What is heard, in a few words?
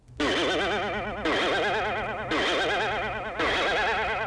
Boing